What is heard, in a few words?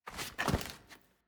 Footstep Glass Rotate